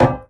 Metal Heavy Collision

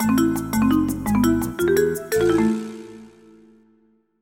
Die; Fernando and Hugo

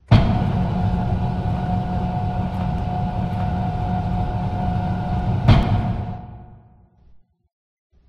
conveyor; Five Nights at Ronald's

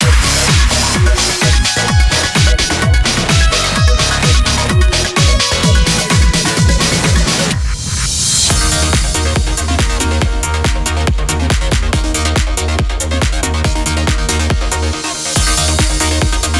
Goal 7th; Gran Turismo 6

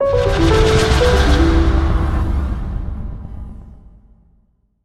End Stinger; Void Bastards

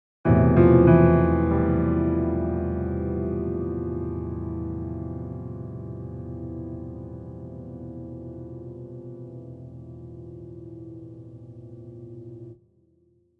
Winter Distraught

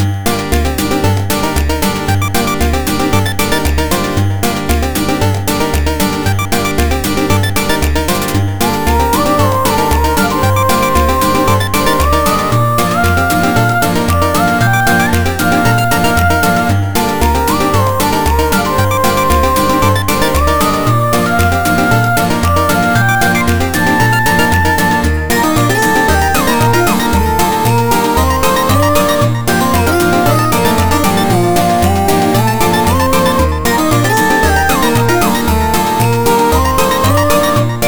bgm field; 3D Dot Game Heroes

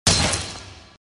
Sfx Dark Lord Dead

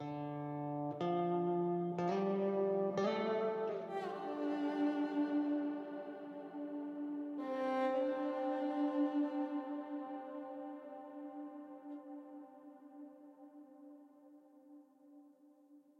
Palace Day Stinger; assassin s creed chronicles india

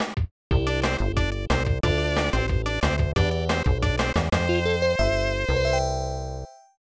Completed; super shougi; snes

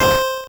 core squish C3

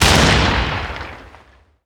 Tnt Box Explosion

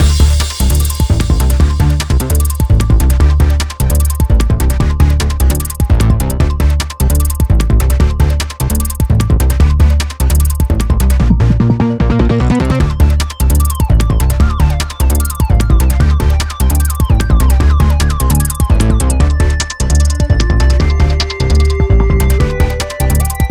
game music fast

